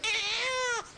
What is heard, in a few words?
baby cry